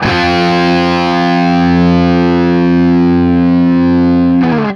5TH F, Guitar; East West; Steve Stevens Guitar Samples Collection

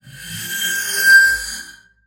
spinet; Xsample VOL-7

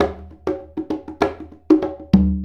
Congas; Essential Percussion

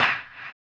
HAMBONE, Vocal Blues Soul; Spectrasonics; Planet

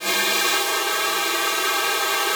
WEQS F#5, ALTOSAX; Xsample VOL-2

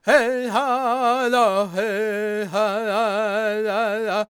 C# MIN, World Voices, LAKOTA

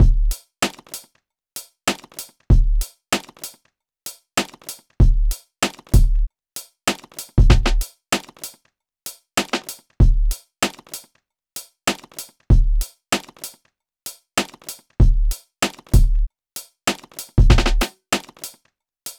Drums, Hip Hop

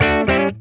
D-A PASS, Funk Guitar; Zero G - Funk Guitar

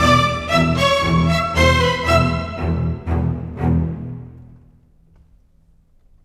Cinematic Strings, Orchestral

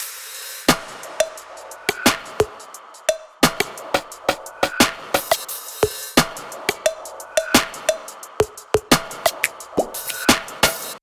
175Bpm Percussion Loops

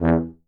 E2 TUBA

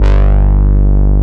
HO G1, ANA HRN

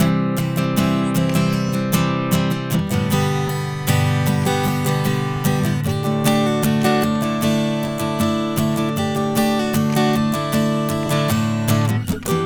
Acoustic Guitar, Indie Rock; Sonic Foundry (Sony Creative Software); Indie Rock Anthems; Session 12 - Spaced Out Knoll

